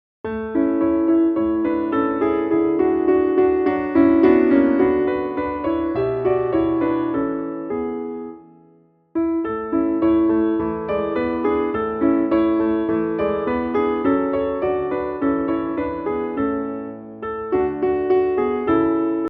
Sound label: slower tempo piano accompaniment; Mozart; Crudel perche finora